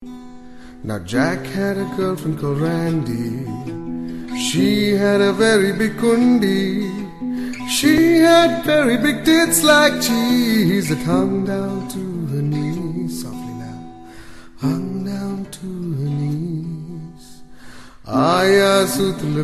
tamil english mix ringtone

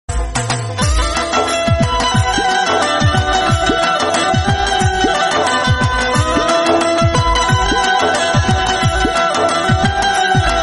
drum phone ringtone; nokia

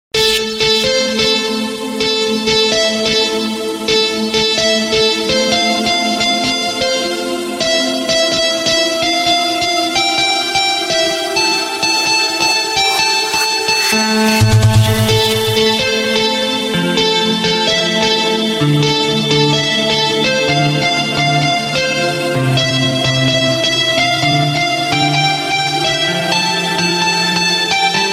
samsung s iii ringtone

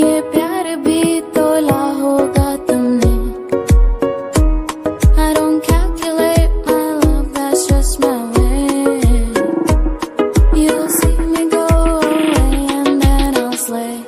sad ringtone; english ringtones

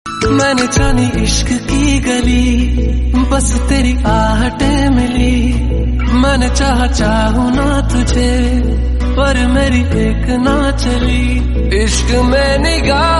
love song tone ringtone, bollywood

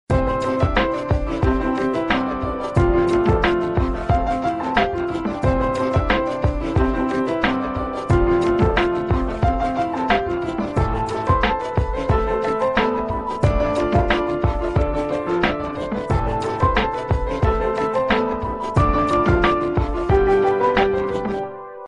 new s5 piano tone ringtone

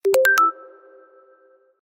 alcatel paint ringtone, sms; nokia

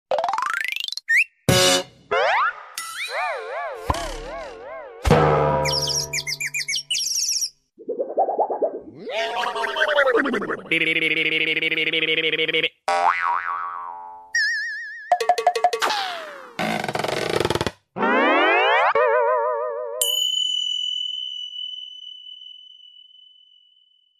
cartoon sound effect ringtone

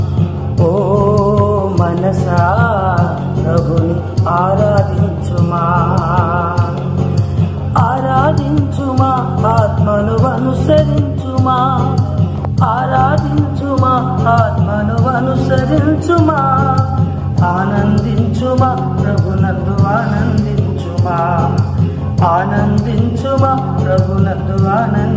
christion song, christian gospel

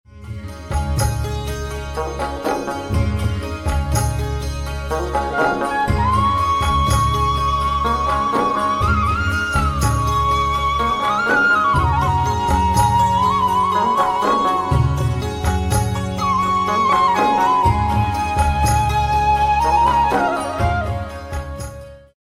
classical Indian symphony ringtone

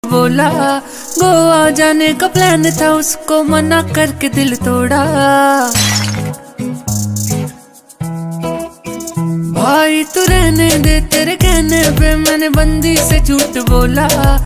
bollywood mix ringtone

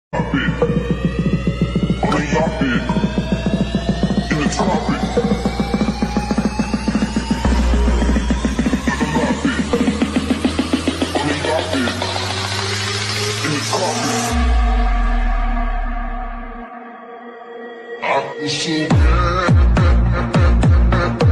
atitude sound effect ringtone

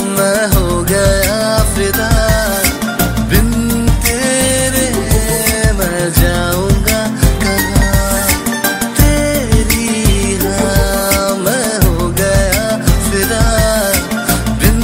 ringtone, bollywood ringtones

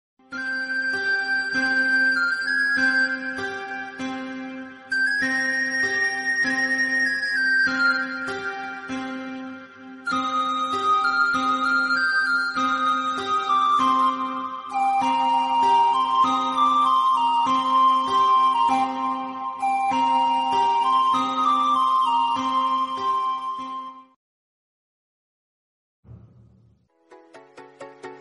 suspenseful mobile phone tone ringtone

